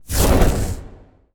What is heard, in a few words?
firebrst inflammation heavy burn fire flame